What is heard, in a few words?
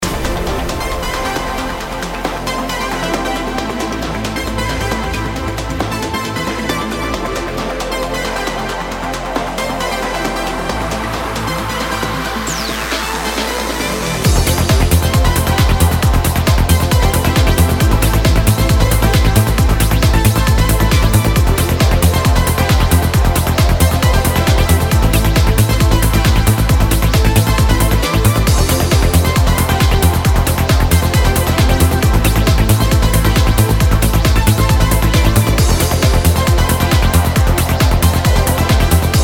map bgm; Lanota; Android